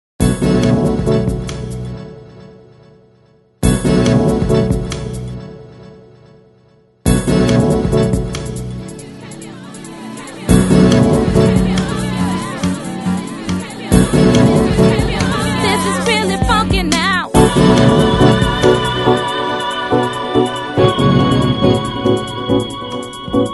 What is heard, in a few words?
funky beat